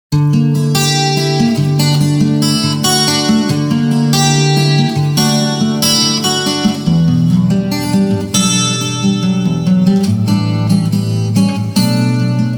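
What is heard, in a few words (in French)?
guitar, guitare acoustique, un effet d'Exciter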